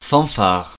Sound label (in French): fanfare